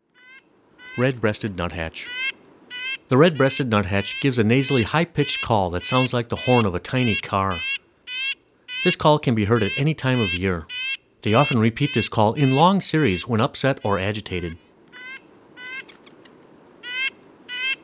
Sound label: Red breasted Nuthatch